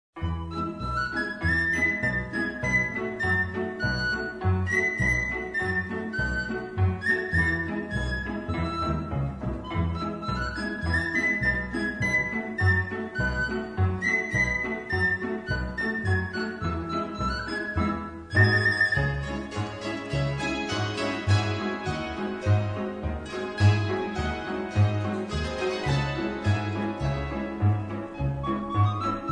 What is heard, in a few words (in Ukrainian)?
melodiyi polka